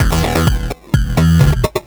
VFX2 Loop 128 BPM

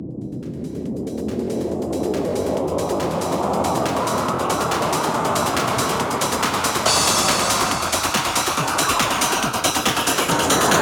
140BPM 8Bars, HandsUp & Trance Fills; Delight; Vengeance Samples

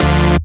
Synth Cuts; Vengeance Samples; VES1 Instruments

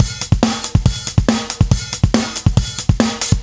Breakbeat 140 BPM

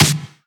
Snares; Vengeance Samples

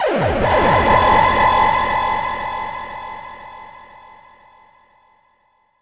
FX Alarm; Vengeance Samples

